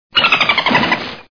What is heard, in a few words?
dolphin sounds